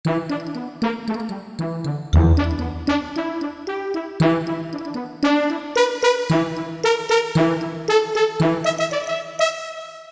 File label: Mallet Horns, Roland PN-D50-01